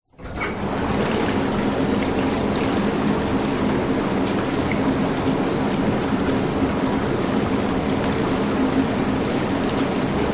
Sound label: household bath spa on run off short bubbles motor whirr close up water